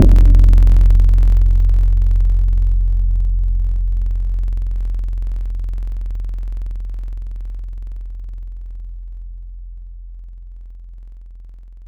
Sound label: Metalic Thumb; Synthetic's Serum Collection; Echo Sound Works Sphere